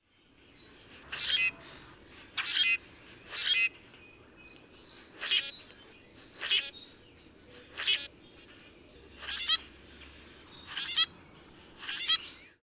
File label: Common Grackle